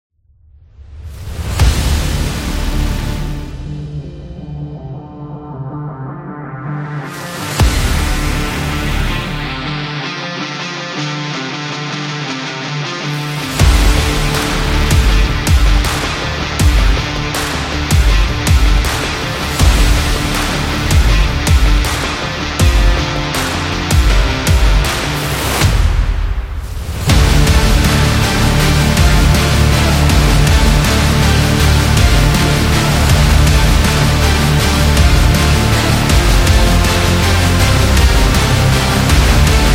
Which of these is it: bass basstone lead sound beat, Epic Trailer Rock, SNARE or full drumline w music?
Epic Trailer Rock